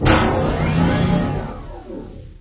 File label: power cycle; Sliding metallic sound going through a single rise-fall cycle.